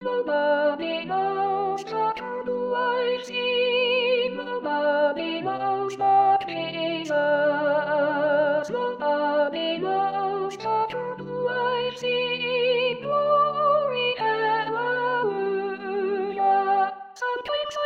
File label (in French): Sopranes Nobody Knows